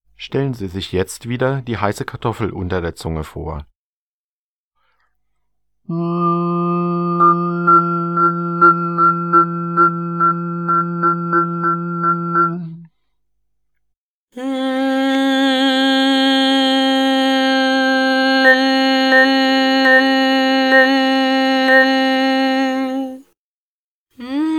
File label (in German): Nen mit heißer Kartoffel unter der Zunge; Buch Oberton Singen CD